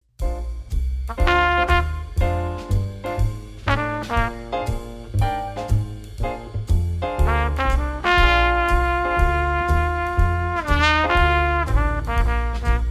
trumpet solo

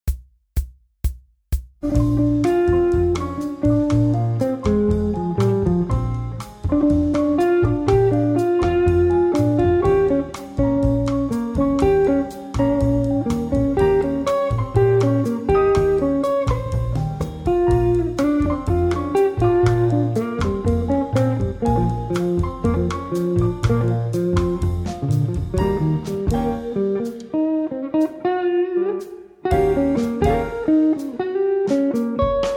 guitar i and iv bossa; transcribing solos

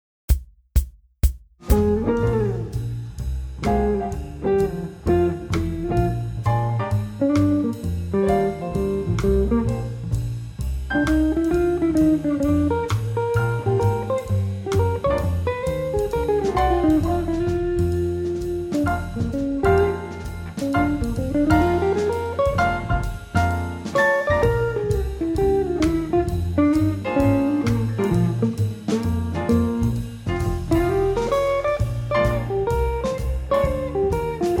guitar i chord swing; transcribing solos

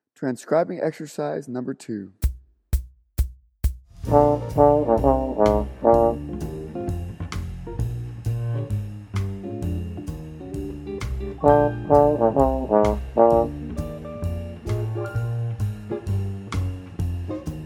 short pattern

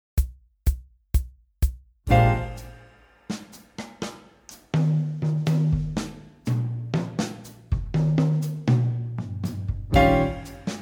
drums i and iv bossa; transcribing solos

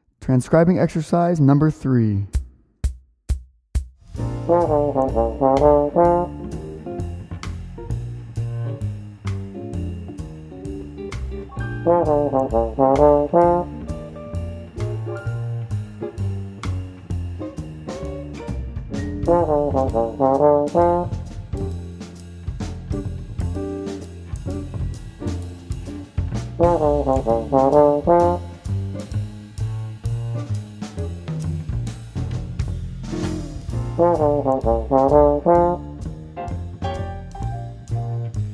short pattern

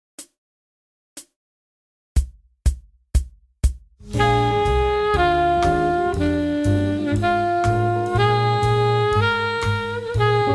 guide tone pattern blues

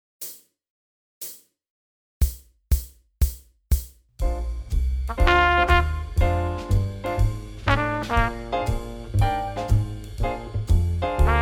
sample solo swing trumpet

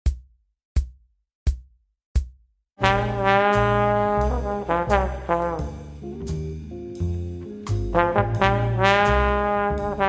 trombone I and IV swing; transcribing solos

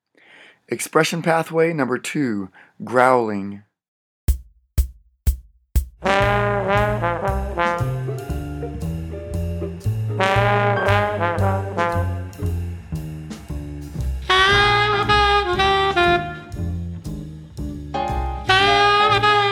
expression growling

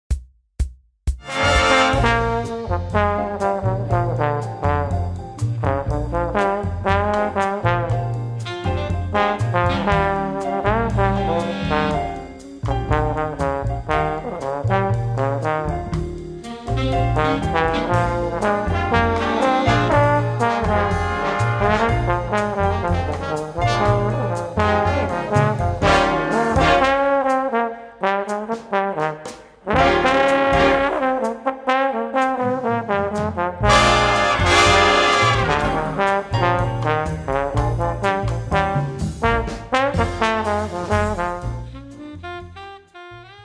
trombone i and iv bossa; transcribing solos

